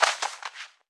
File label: Pigeons; rabbids multiverse; iOS, macOS, Mobile